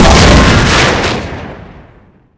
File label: sherman destroy; WAR